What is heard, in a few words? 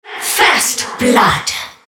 first blood